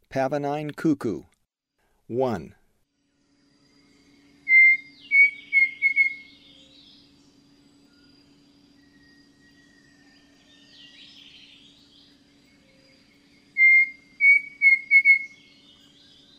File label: Pavonine Cuckoo; S. America; Eastern Lowlands